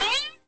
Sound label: jump; vortex; spongebob